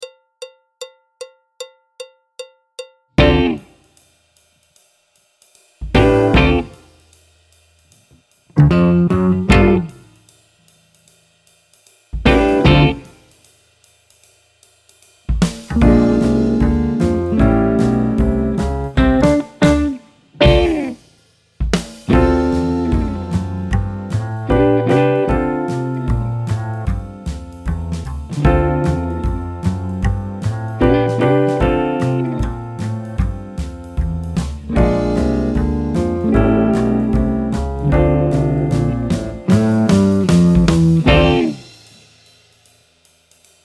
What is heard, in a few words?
alto, trombone, piano; Jazz Syllabus Level 4